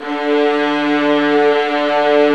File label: ARCO VIOL, Arco Violas